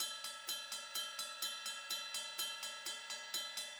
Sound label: 126 Pop-Rock Drumloops; USB Soundscan vol.37; AKAI